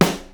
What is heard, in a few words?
clear snare sample f sharp key; Mono